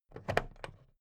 plastic clasp open regular

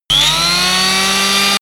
saw start

